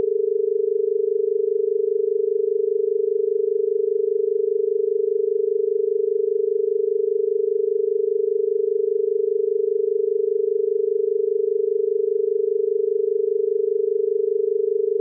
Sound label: Au Dial, tones